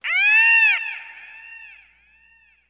bird echo

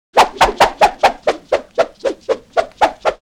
swish multiple; crashes swishes impacts; Asian Show Sound Effects

